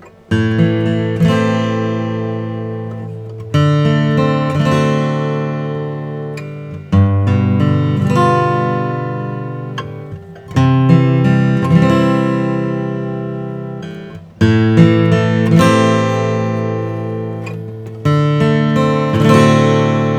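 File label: 2014 martin vintage series hd 28, guitar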